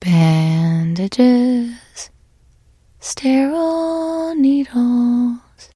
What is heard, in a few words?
trader singing; 商人杰恩（七日杀）